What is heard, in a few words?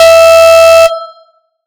Alarm Long